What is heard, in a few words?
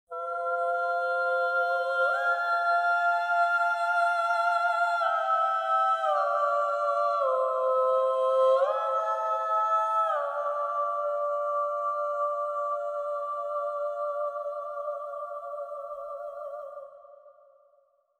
singer interlude; Tyranny Gold Edition